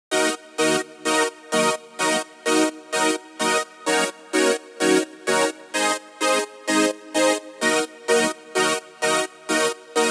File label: 128BPM Chords Root D#; Vengeance Samples; Basket Kit